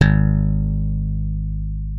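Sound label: left thumb G#1, slap thumb; Xsample VOL-1; 90 s Sample CDs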